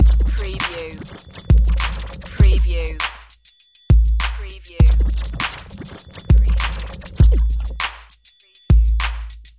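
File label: Scratch Fast BT 100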